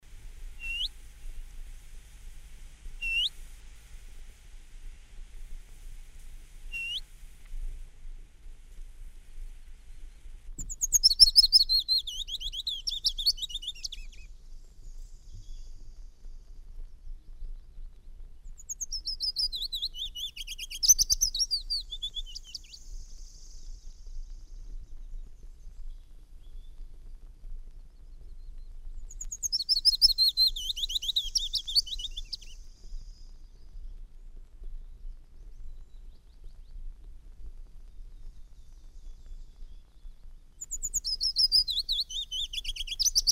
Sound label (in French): fitis, les pouillots